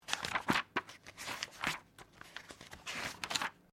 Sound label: Book Page Flip Sequence; Book movement; Offices; Books